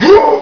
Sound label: pain; action-quake2; quakeguy